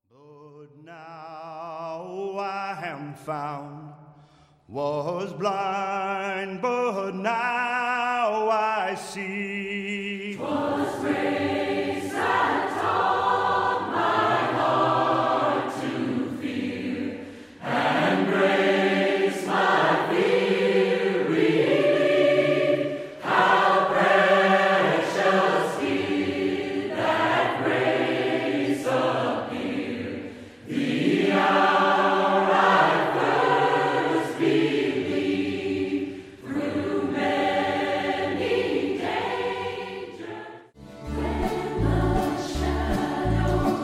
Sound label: Folk Medley